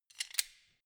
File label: wrench close soft; schrauben drehen hydraulik